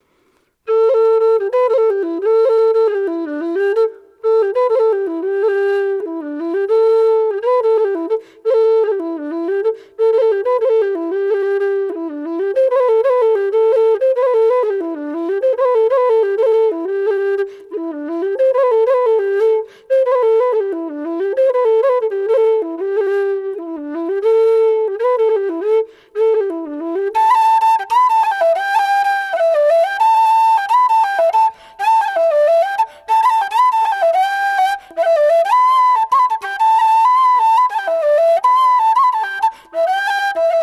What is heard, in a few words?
low d fast